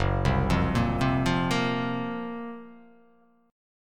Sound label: F sharp Major 7th Flat 5th